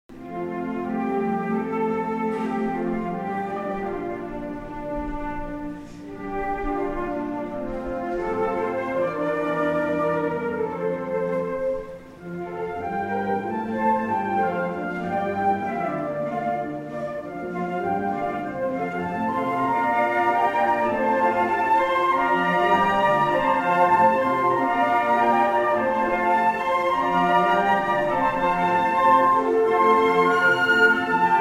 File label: consert, flute; arietta-flute